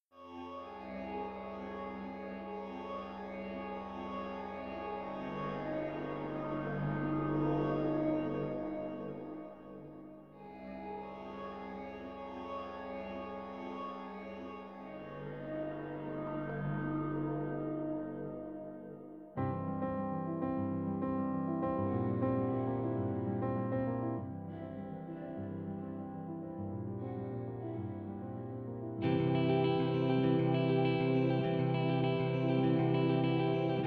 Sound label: instrumental; Friedenssirenen